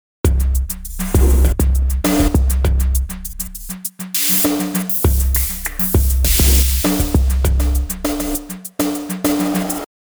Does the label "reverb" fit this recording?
Yes